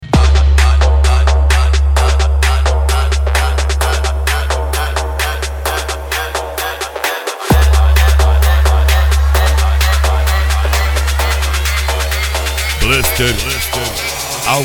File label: bluster alto falantes especial bass